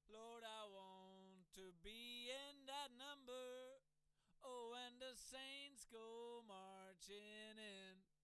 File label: GAP Neve Phase Invert SING 414XLS